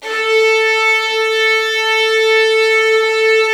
OPN A2, Virtuoso Strings; ILIO; Kirk Hunter Virtuoso Strings VOL-1

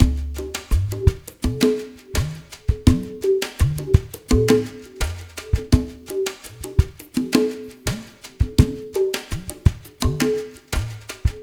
REMIX; Spectrasonics; Liquid Grooves 1 & 2; SAUNTER

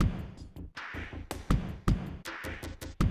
Classic DrumLoops; Best Service; XXL 1500 Classic DrumLoops

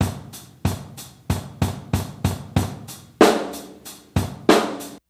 Chillin, Hip-Hop, Bonus Loops; Sonic Foundry (Sony Creative Software); Hip-Hop Street Beats Construction Kit; Street Beats by Poogie Bell

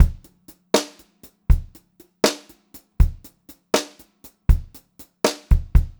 BEAT, Brushed Drum Loops

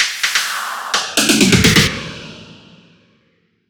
Fills 128BPM, Clubsounds

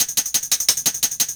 Shake 175, Drum&Bass Perc Loops; EZRollers

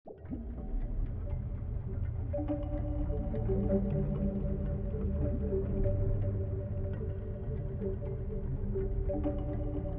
Suspense Bubbles, cinematic soundbeds; musicradar

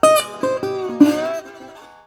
Blues Guitar Samples; ILIO - Fretworks

